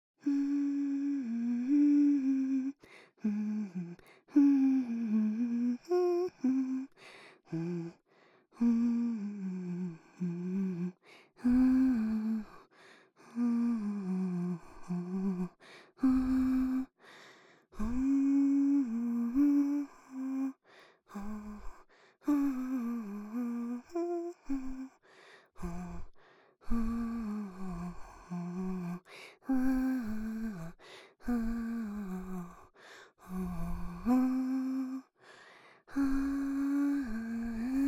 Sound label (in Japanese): 両耳、鼻歌のみ